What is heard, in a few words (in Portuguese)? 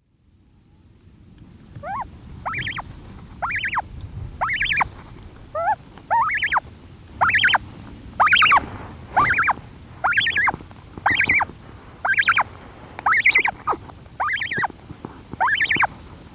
Cantos Cagarro Crias; aves marinhas